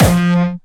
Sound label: Distortion Kicks Kick F3; Bassdrums; Vengeance; Essential Clubsounds Vol.4; Bonus TonalHS Kick